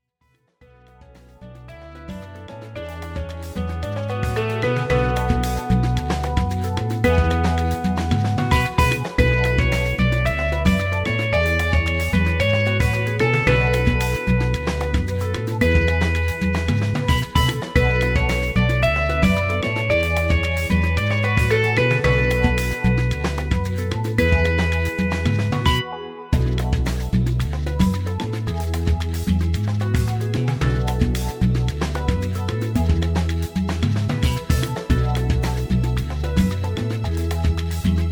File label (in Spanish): Musica Latina Alegra Salsa